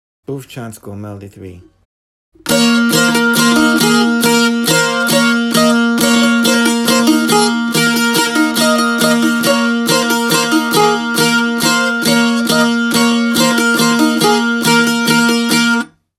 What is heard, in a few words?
tambura; Bufcansko